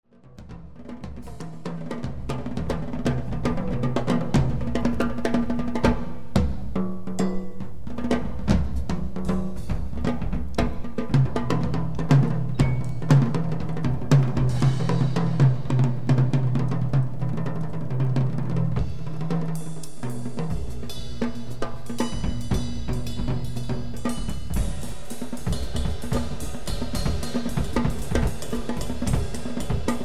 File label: drums